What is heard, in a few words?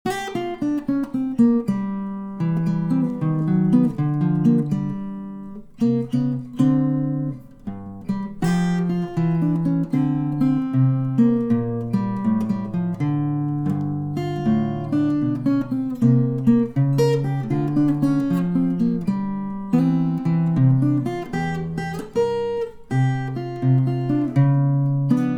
blues